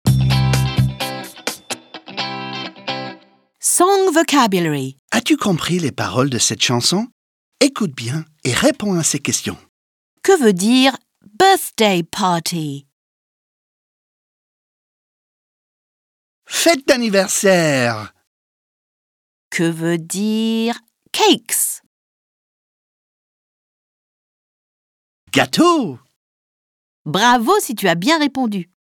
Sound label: song The happy birthday song